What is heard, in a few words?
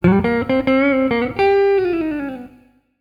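Guitar, Jazz for piano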